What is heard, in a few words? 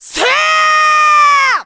Final Blow; Link's final blow on Ganondorf; Zelda